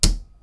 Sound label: shelf close